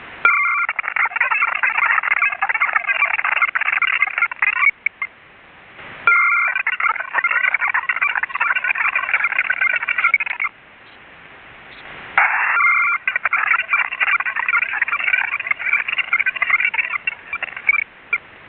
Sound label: HF 2x120Bd FSK